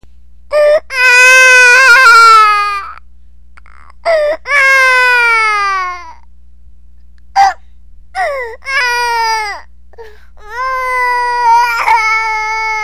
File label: baby cry; people